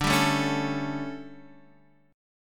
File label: D Major 7th Suspended 4th, Guitar, Drop D